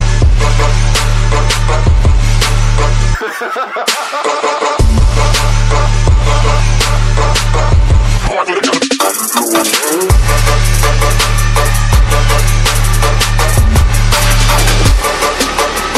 Dubstep

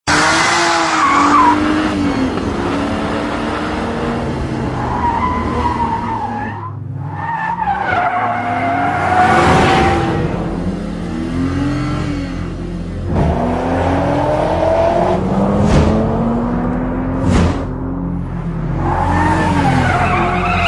B M W Accelration

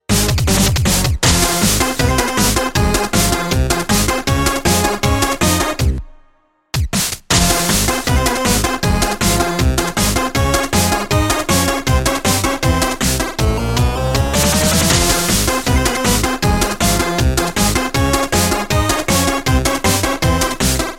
8Bit

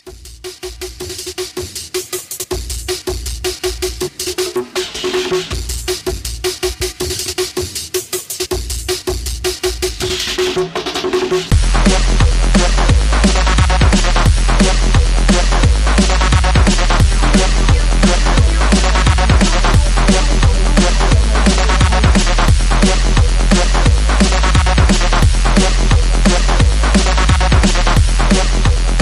Uk Remix

